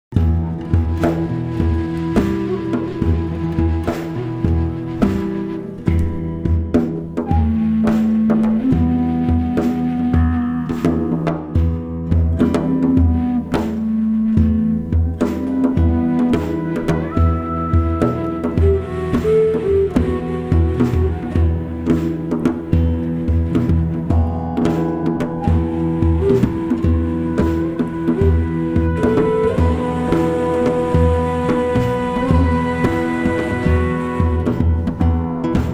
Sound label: fujara solo